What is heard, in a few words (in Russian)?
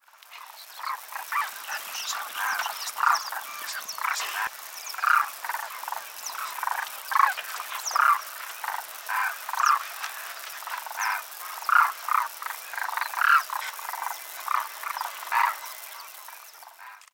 zhuravkr